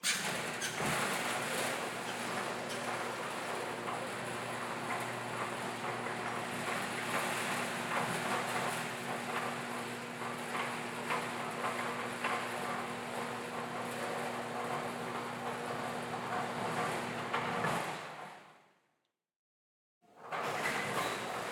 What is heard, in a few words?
basement parking garage gate metal general perspective open close; TheLibrarybyEmptySea; Gateway